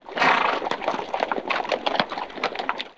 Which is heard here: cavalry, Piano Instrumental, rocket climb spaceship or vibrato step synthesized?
cavalry